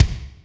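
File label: kick Monstr Room, Alesis D4 drum machine; 300 Drum Machines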